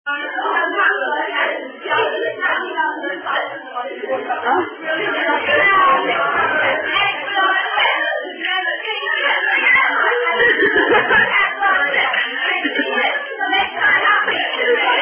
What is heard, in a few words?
Childrens Rhyme With Gestures; Apple On A Stick